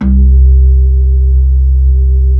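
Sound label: B-3, blues percussion; Keyboards of The 60's and 70's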